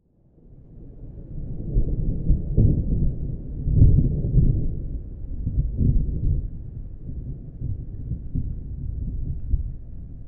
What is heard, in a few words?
very far, nature; Atmospherics